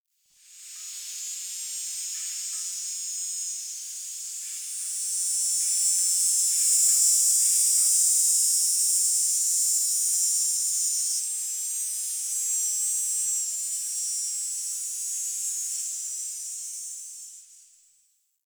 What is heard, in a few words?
Tree Cicada; Warframe; Ambience; Nineteen Ninety Nine